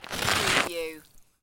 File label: Snow and Ice, Hard snow or ice scrape debris